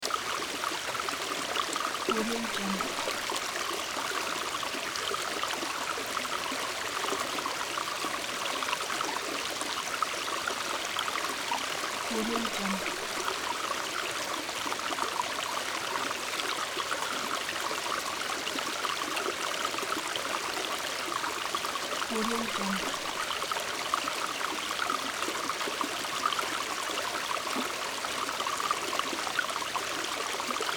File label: water stream swirl